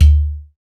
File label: UDU, African